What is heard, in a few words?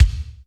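GATE KICK; Crypton - DrumBase5000; GATE KITS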